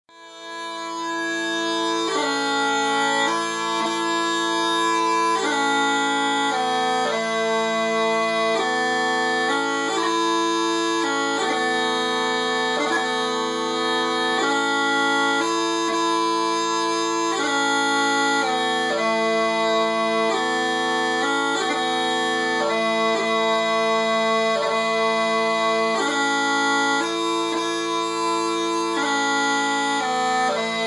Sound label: smallpipes; Bagpipes